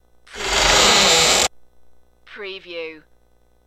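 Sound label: Door Creaks High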